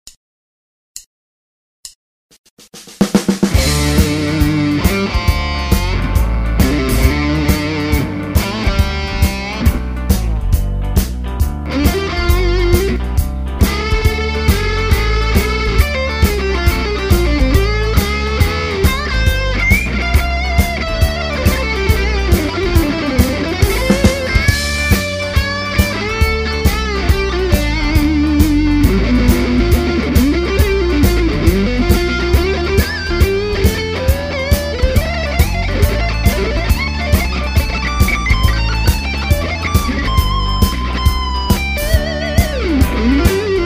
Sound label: Blues Jam